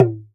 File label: MEXICAN HEAD, MEXICAN UDU